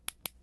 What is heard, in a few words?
Flashlight on; Phasmophobia